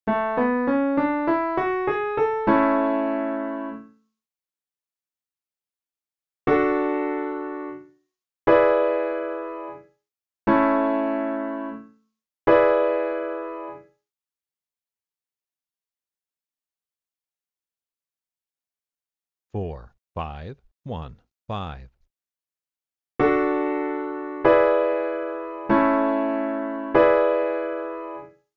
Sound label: I, IV, V7, progressions; easy